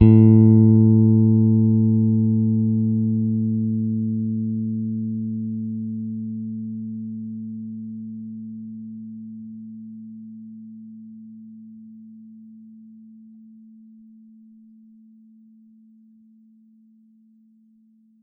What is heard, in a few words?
Gs A3 soft, Bass, Pedulla Thunderbass; Giga Samples Collection